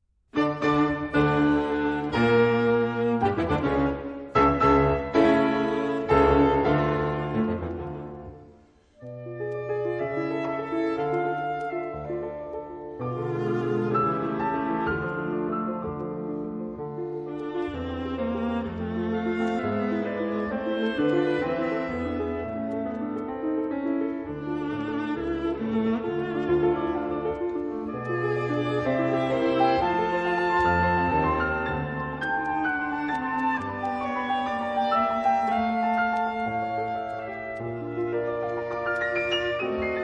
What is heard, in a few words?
trio; glinka